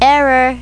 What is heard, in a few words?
error